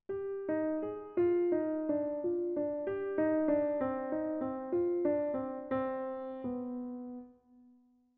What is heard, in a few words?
motives; 05 - C Minor 3/4